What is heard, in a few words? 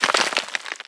debris dirt, bullet impact debris